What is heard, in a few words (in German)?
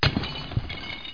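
glasklirren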